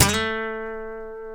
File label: B, funk guitars; Spectrasonics; Hans Zimmer Guitars VOL-1; TAKA FUNK